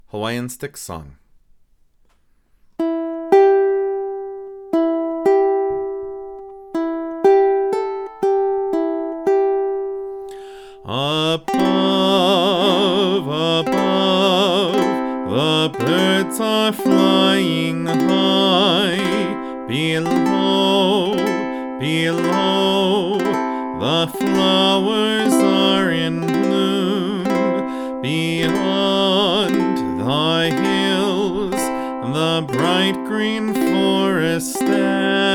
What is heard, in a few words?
Hawaiian Stick Song, ukulele